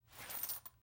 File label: coins in pocket